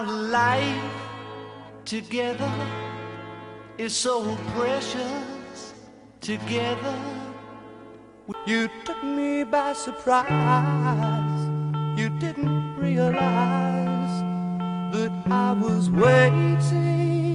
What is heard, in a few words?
laughing